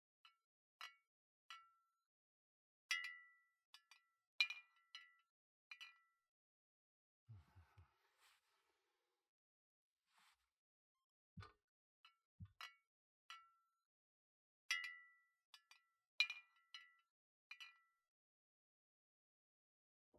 カクテル ASMR; 亜夜芽の作るカクテルASMR

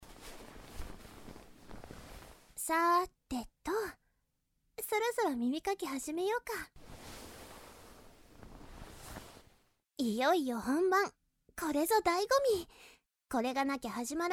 耳かき, ASMR

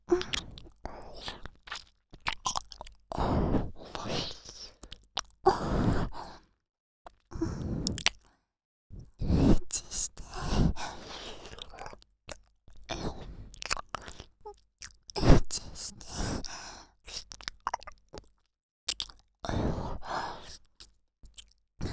耳舐め 喘ぎ; エッチしよ♪; 静華

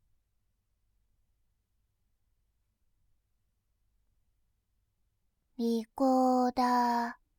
リコーダー; モノラル

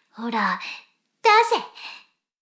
しこしこ音声; 牧場戦腺; 少佐殿

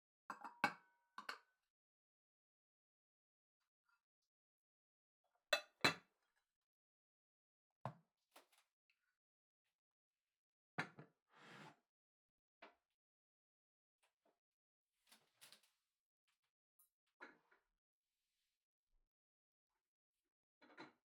食器を重ねる; KU100

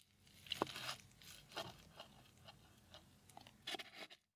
卵焼きを切る音

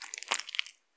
膣内射精

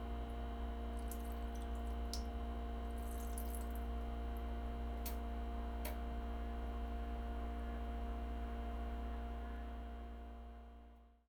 小さめおしっこ音、ガス音

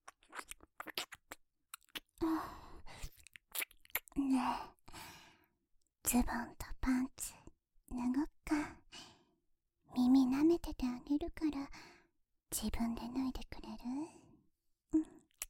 ゆっくりねっとりチュッチュパちゅ♪（効果音なし）; 大好きな先輩に責められ快楽～セットパック～; マトリョウ鹿

